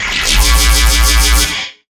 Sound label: zap